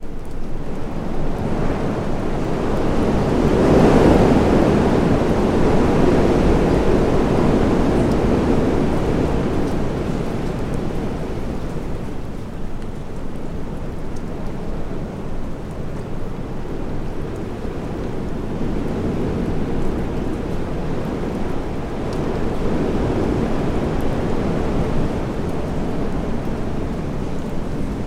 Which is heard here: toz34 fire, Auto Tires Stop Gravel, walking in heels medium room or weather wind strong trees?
weather wind strong trees